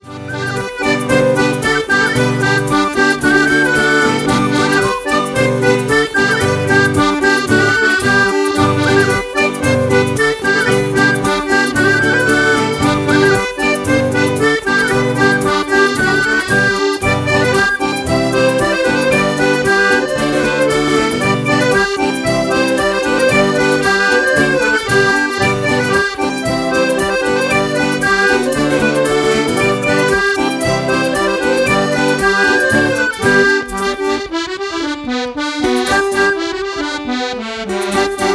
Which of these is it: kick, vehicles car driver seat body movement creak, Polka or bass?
Polka